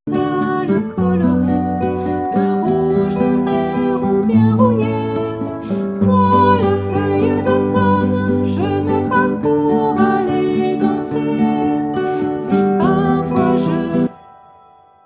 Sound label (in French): CHANT